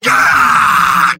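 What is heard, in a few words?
sniper pain critical death; vo; tf2; mvm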